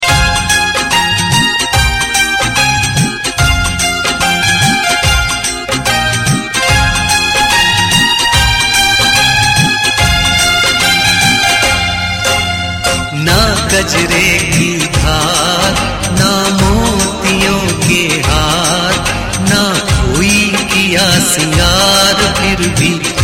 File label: Mobile tone